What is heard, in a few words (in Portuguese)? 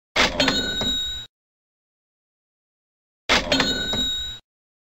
caixa registradora